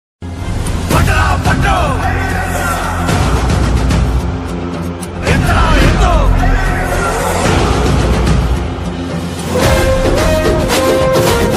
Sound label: telugu bgm ringtone south indian bgm ringtone